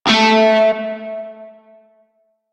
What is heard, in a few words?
Shawzin Oct Two A; Music; Warframe; Narmer Shawzin